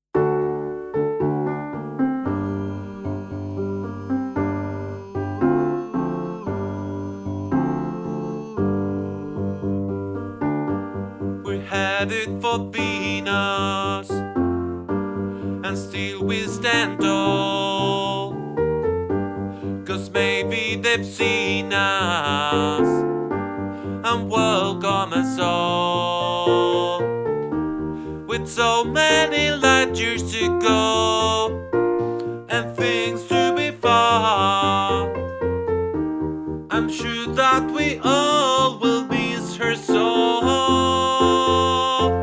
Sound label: TENOR, SHOW CHOIR; ADIOSES SHOW CHOIR; THE CASSETTE EXPERIENCE